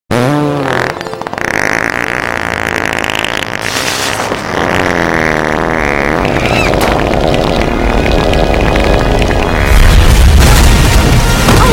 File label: Fart